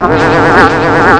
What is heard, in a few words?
pain; QUAKE2